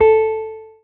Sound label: marimba guitar; ゆめ2っき